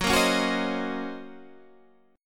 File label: Eb Minor 13th Gb